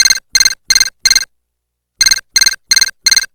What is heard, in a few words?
transceiver